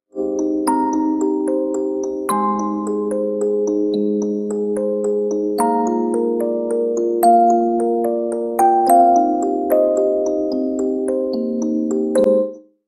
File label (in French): boite a musique; barbie; melodies disponibles pour boite a musique personnalisee